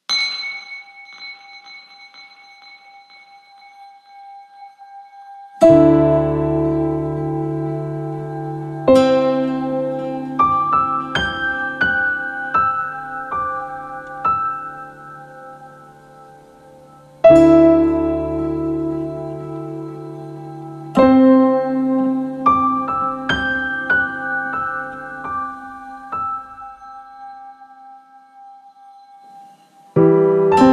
Gentle Baby Meditation Soundtrack Music

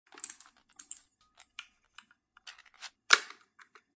ammo loaded into magazine; Fire Weapons Sound Effects

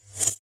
Futuristic Weapons Sound Effects

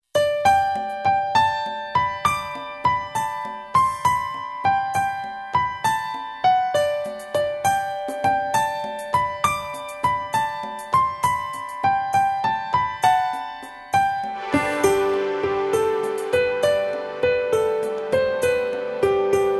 I Saw Three Ships

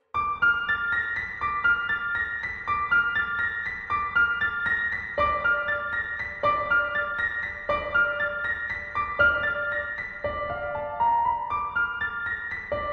Classic horror

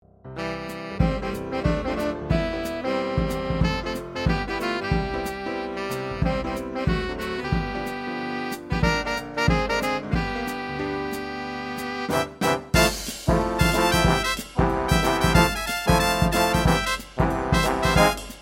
Vegas glitz, Jazz

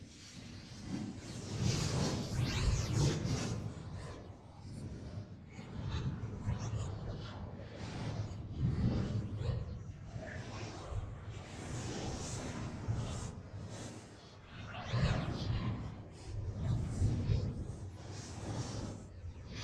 sfx, futuristic weapons sound effects

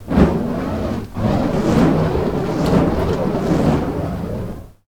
Fire Staff, Fire sound effects

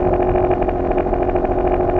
Engine; Magic And Spell Sound Effects

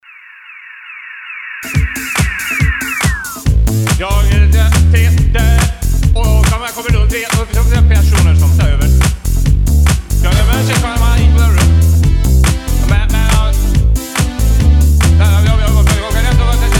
Dance

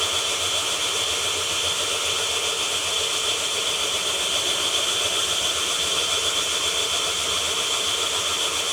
Futuristic Weapons Sound Effects

